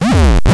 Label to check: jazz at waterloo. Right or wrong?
Wrong